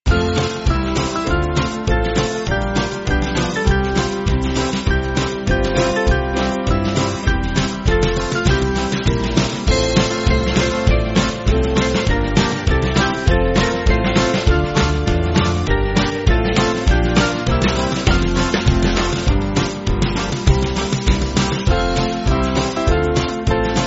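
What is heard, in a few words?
Band, Backing Files for Churches; Fight The Good Fight; Shepton Beauchamp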